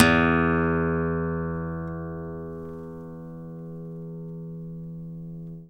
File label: Nylon Guitar